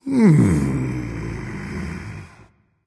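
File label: nonverbal content; smite; voicepack; ullr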